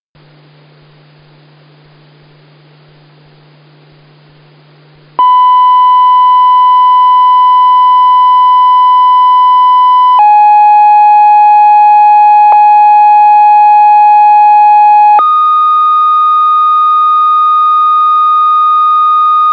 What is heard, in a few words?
fixed tones fm